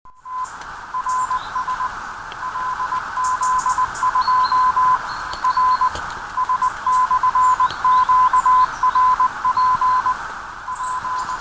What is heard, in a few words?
Home made